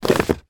household small childs book slide in among other books on bookcase